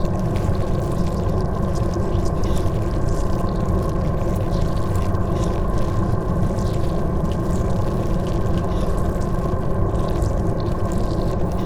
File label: ant ship mauler idle; Stellaris; biogenesis; ships